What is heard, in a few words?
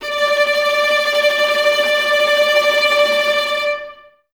Violas Tremolo D 4, String Ensembles; Miroslav Vitous